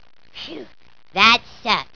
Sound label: sucked